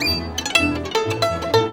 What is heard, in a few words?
VIOLIN LINES; Best Service; World Colours